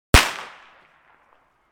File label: Fireworks firecrackers single explosion